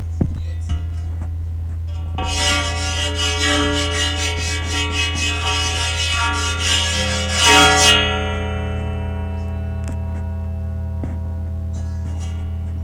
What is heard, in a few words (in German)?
tower kreide saegeblatt